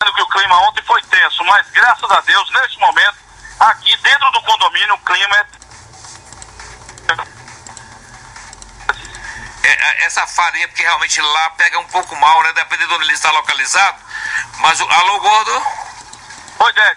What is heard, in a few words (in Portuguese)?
ritmo